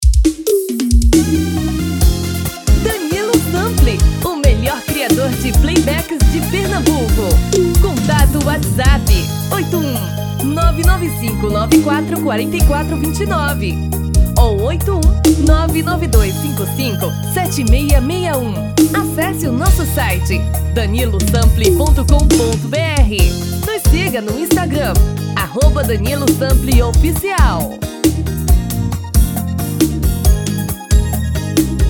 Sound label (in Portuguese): VERSAO BREGA Tom feminino